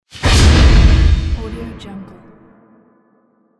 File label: impact